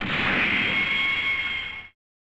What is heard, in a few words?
Pistol; appleseed prometheus no shintaku 1994 snes